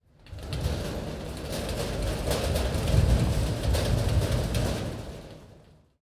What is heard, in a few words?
Creepy Door, Metal Light Rattle